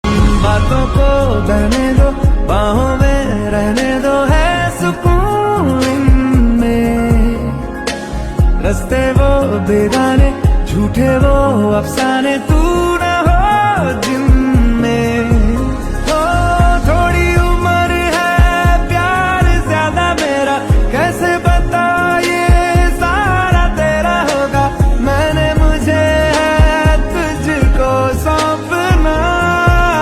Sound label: Ringtone